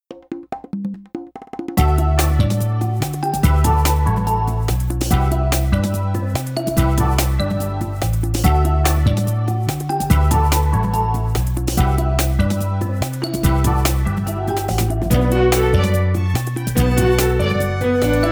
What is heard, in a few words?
Music Stage Multiplayer; Army Rescue; Wii